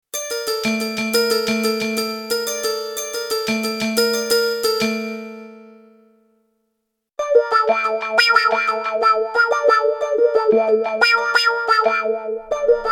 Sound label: retro autowah post hall